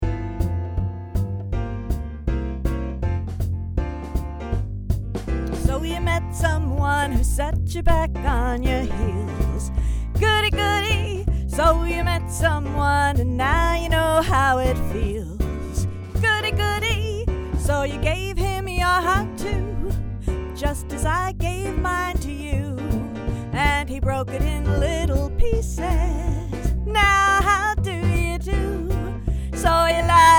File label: vocal